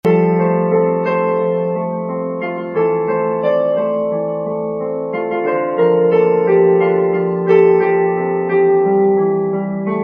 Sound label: Emptiness Piano